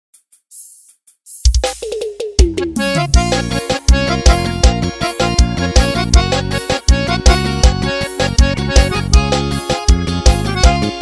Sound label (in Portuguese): pisero playback